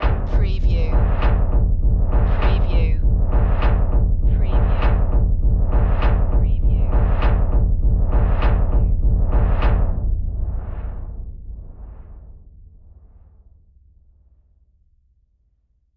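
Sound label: Production Element Sweeper; Sound Effects Bible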